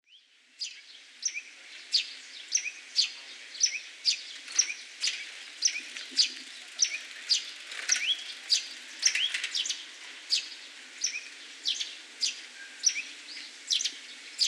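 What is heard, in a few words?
Eurasian tree sparrow, hino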